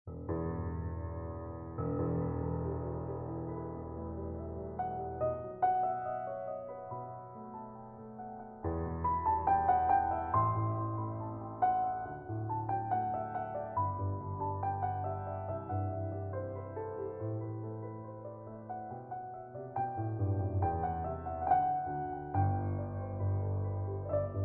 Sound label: Invention in E Minor